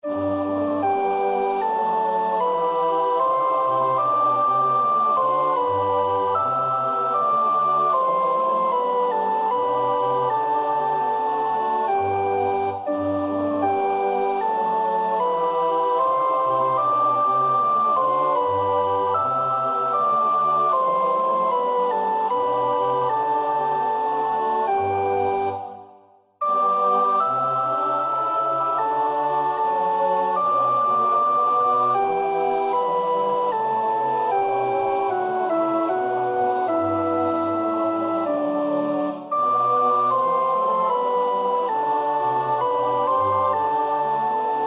sopraan, koorpartij oefening; Bach (Johann Sebastiaan); BWV 099

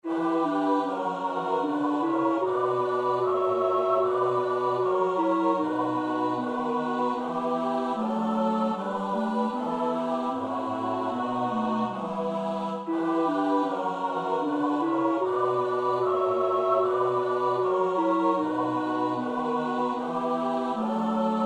tenor, koorpartij; Bach (Johann Sebastiaan); BWV 158

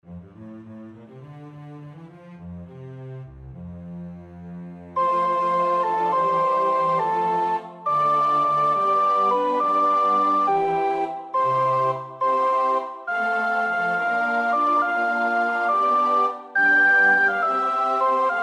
sopraan; Purcell (Henry); King Arthur

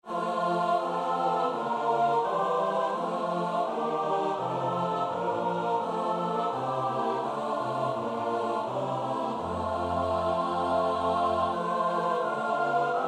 S-A-T-B, koorpartij oefening; Somervell (Arthur); The Passion of Christ

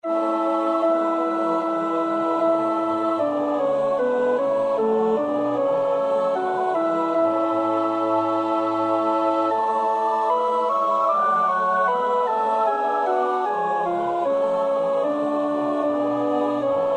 alt, koorpartij oefening; Bach (Johann Sebastiaan); BWV 197; Du süße Lieb, schenk uns deine Gunst

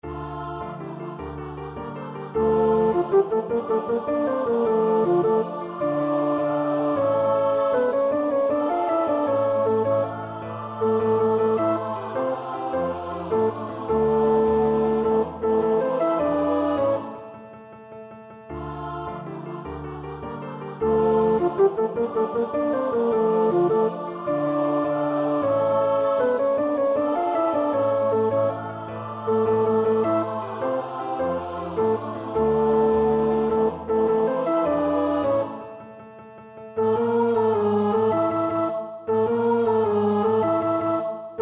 tenor, koorpartij oefening; Wagenaar (Johan); De Schipbreuk

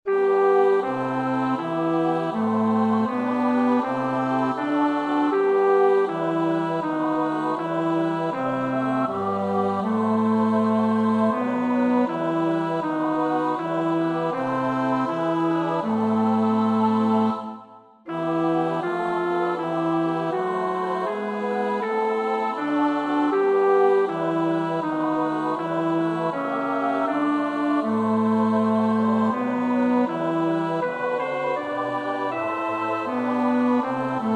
bas; Reger (Max); 7 geistliche Volkslieder

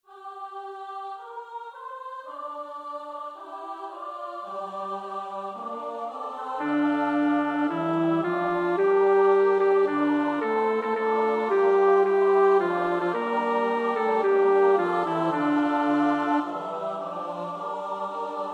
bas 1; Rossini (Gioacchino )

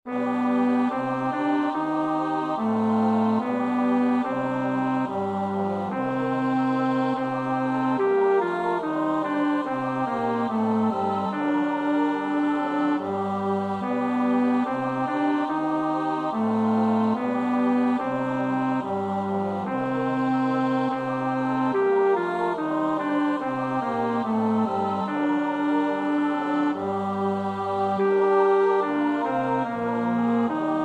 bas; Bach (Johann Sebastiaan); BWV 018; 5. Ich bitt' o Herr, aus Herzensgrund

